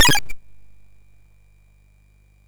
MOS6581R2, sid; Defenders of the Earth

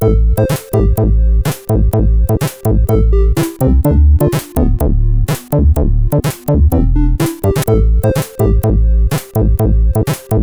sid CSG8580R5

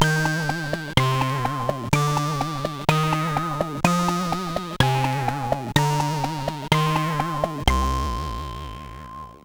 sid CSG8580R5; Alien 8

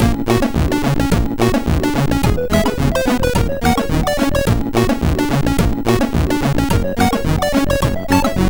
sid MOS6581R4; Softwired

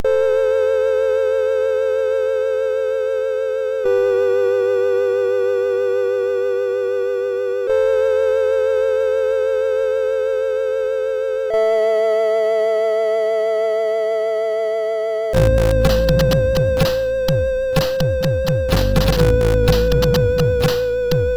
sid MOS6581R4

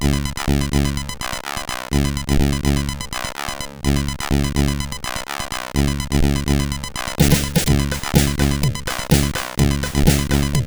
Intro, CSG8580R5

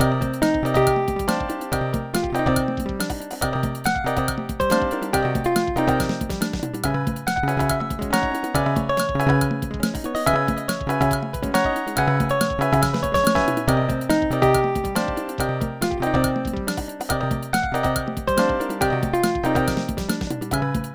solo; Dr. T's MIDI Recording Studio

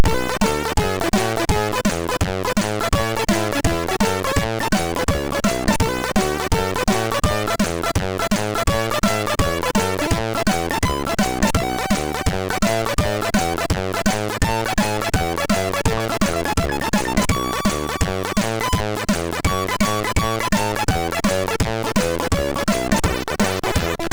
Synthetic Jazz, MOS6581; sid; MOS6581R3

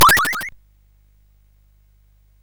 sid CSG8580R5

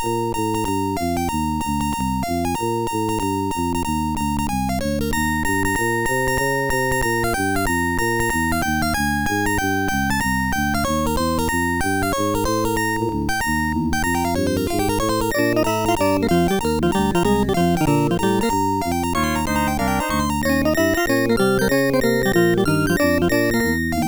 jazz song; The Music Studio

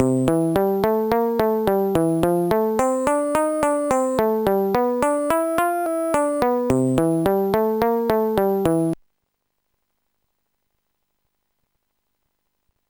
bass; Sonix Music Driver

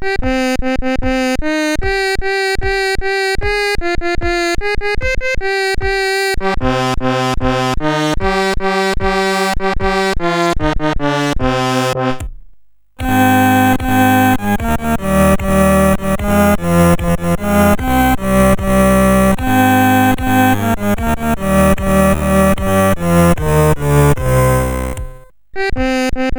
Wellerman Loop; CSG8580R5

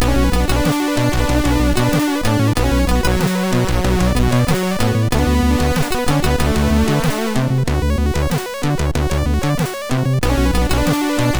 sid MOS6581R2; Owen 037

